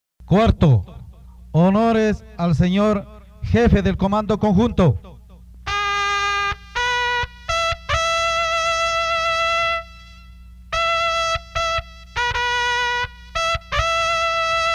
honores jefe comaco, toques de trompeta de caballeria; himnos de caballeria

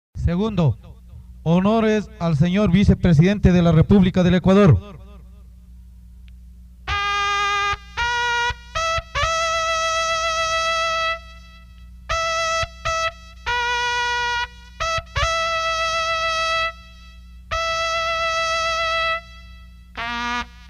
toque honores visepresidente republica, toques de trompeta de caballeria; himnos de caballeria

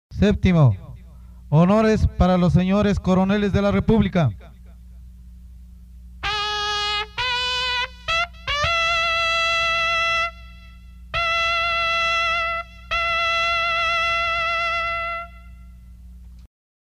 honores para coroneles de la republica, toques de trompeta de caballeria; himnos de caballeria

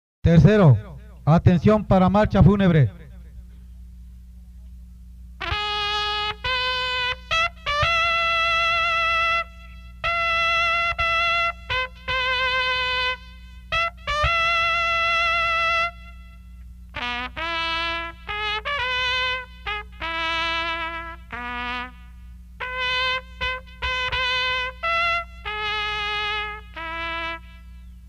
atencion para marcha funebre, toques de trompeta de caballeria; himnos de caballeria

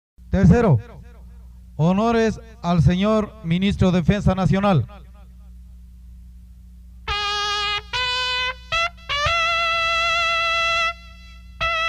honores ministro defensa nacional, toques de trompeta de caballeria; TOQUES DIARIOS DE TROMPETA; himnos de caballeria

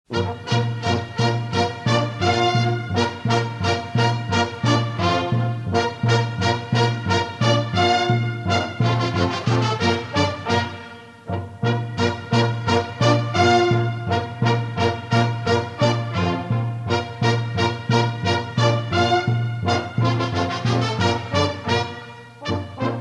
trote, marchas de caballeria; escuela de caballeria; himnos de caballeria

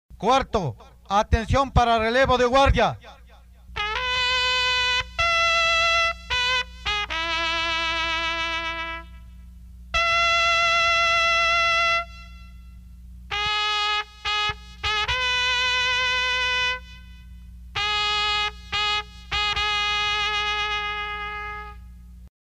atencion para relevo de guardia, toques de trompeta de caballeria; himnos de caballeria

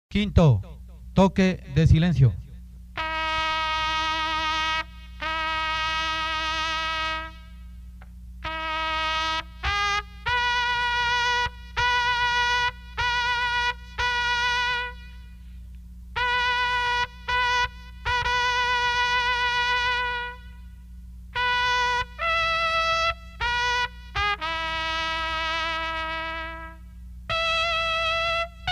toque de silencio, toques de trompeta de caballeria; himnos de caballeria

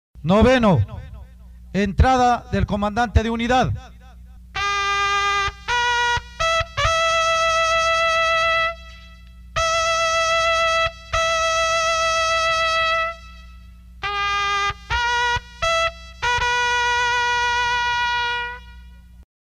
entrada comandante de unidad, toques de trompeta de caballeria; himnos de caballeria

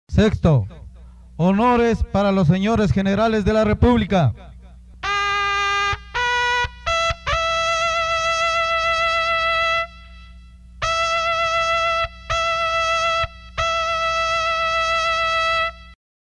honores para generales de la republica, toques de trompeta de caballeria; himnos de caballeria

